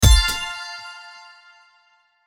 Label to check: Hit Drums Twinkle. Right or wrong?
Right